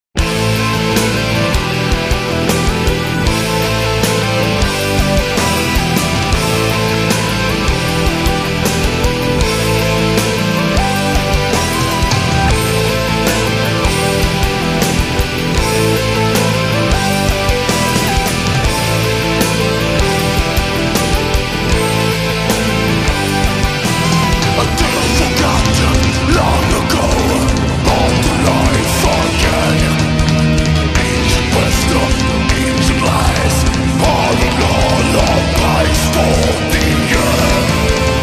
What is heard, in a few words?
metal death metal progressive death metal